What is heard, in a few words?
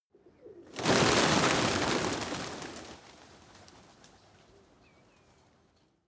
pigeons flock rise heavy; natur-tiere; voegel